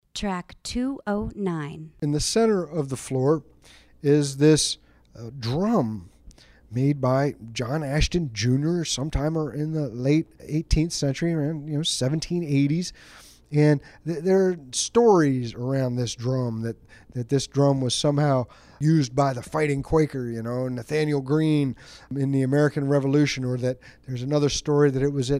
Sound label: Colonial Drum